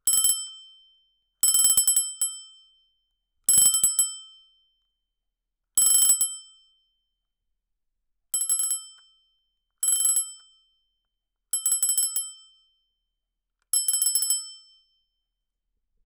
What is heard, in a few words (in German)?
Drehklingel